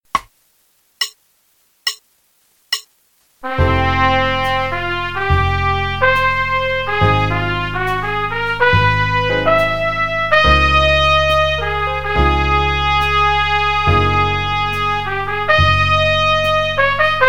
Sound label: Jazz Trumpet Audition, qn=69; 2018 CBDA CAJ